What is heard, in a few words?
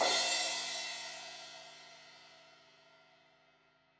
Crash 16in edge, Cymbals; Deeply Sampled Drums